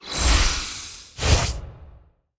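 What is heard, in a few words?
Summon Card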